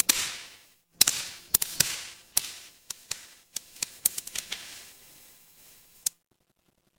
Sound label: fire electric crackle